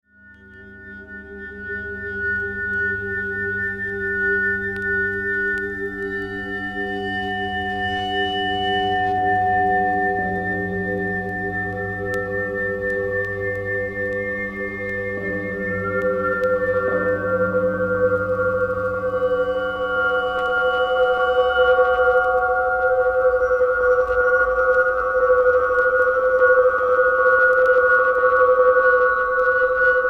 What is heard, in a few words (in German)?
glasmusik